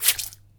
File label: Short Water Squish